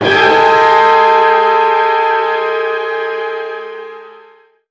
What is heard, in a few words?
hit